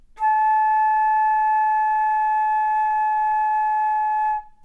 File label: flute high A